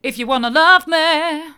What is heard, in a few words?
hard, vocal